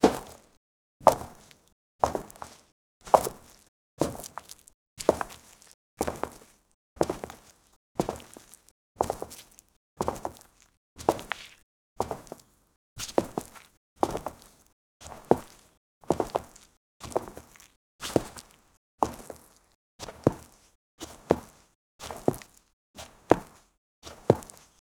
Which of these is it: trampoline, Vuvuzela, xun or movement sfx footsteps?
movement sfx footsteps